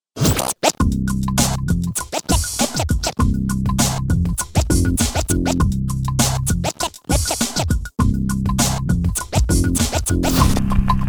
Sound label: scratch that, bed